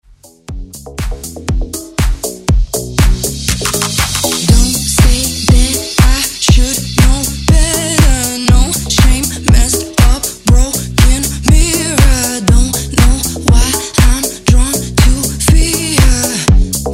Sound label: Dance Redrum